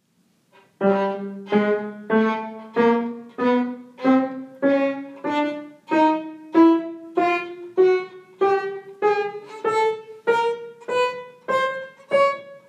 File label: violin piano chromatic